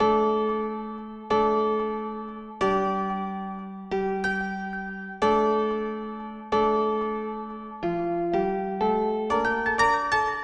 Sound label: Acoustic Piano, Loops